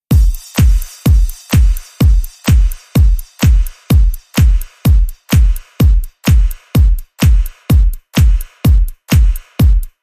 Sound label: Beat